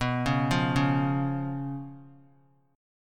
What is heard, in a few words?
B Suspended 2nd Flat 5th B; arpeggiated; JGuitar